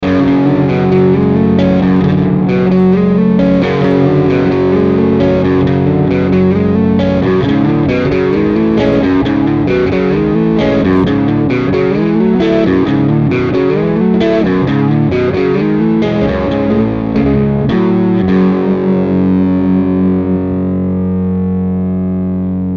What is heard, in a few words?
Orange; Warwick Rockboard